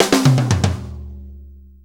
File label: 6-8 Fill, Drum Tools